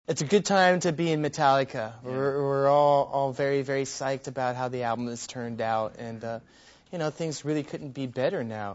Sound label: metal